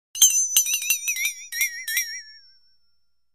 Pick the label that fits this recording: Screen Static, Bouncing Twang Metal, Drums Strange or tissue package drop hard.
Bouncing Twang Metal